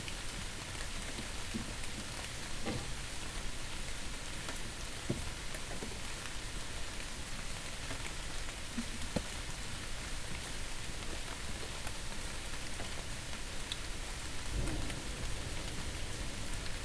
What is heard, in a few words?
Rain